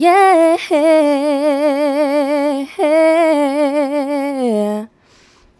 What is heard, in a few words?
Female Vocal